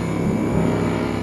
hologram move; Source Engine; tf

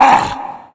tank pain; Left 4 Dead; Source Engine

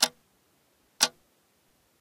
ticktock, ambient machines; Source Engine; cstrike

